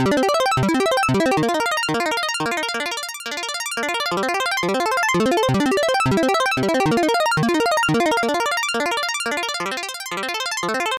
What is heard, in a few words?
175BPM Arps